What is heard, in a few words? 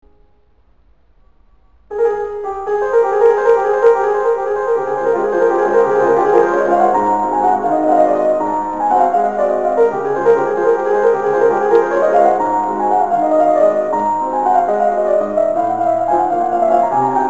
Waltz in D flat major op64 no1, piano; Chopin